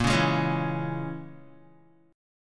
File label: Bb Minor 7th Flat 5th, Guitar; Bbm7b5 strummed